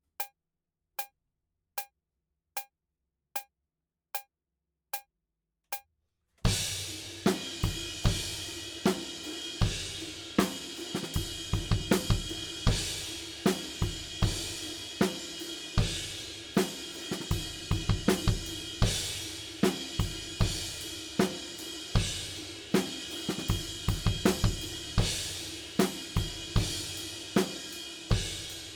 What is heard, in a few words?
Drums ONLY; Pre Pro